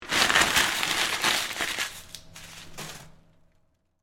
Paper Towel Toss, Office, Paper, Towel, Toss, In, Garbage, Can, Public, Bathroom; Paper Sound Effects; BlastWave